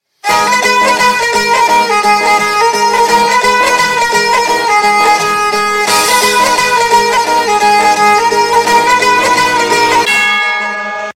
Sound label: Punjabi Best Theme